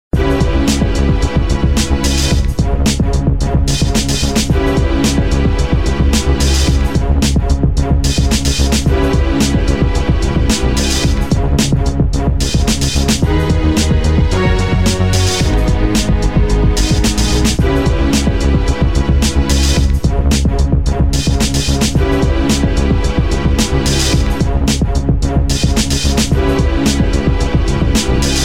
Dungeon Boss Battle; The Fable of Ruby android

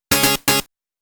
Goal; Sega System24 Sound Collection

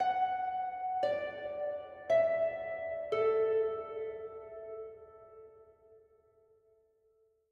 Harp High

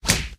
Game Punch

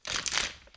Zip

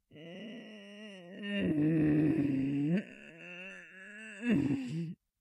groan; Devour

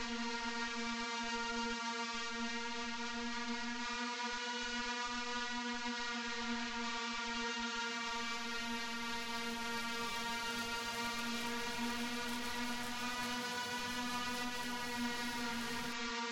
Storm Guitar thing; Solus Project

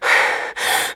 Fatigue Medium

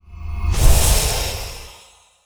light attack

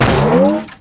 hit rock; Tiki Kart 3D

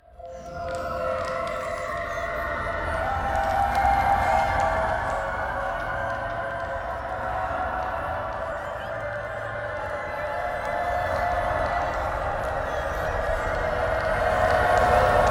Combat Suite Main Ambient; Super Gore Nest

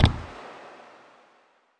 Push; Stair Dismount

